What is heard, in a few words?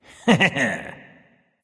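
"heh heh", nonverbal; smite; khepri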